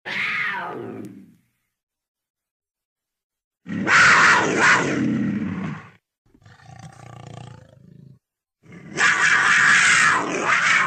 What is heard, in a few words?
Puma